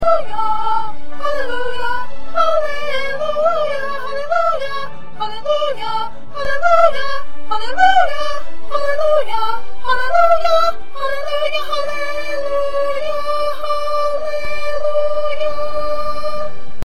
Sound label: Soprano